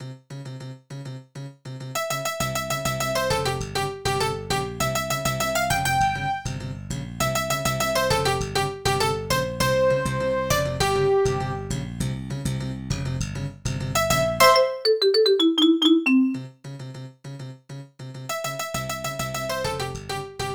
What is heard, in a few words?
Roland SC-88; SimCity 2000